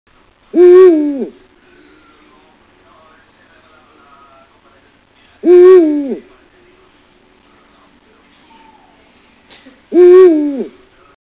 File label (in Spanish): canto Bubo bubo Macho, rapaces nocturnas